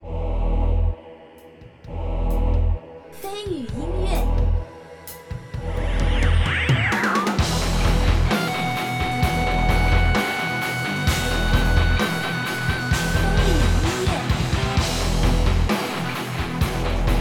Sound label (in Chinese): 军事要塞boss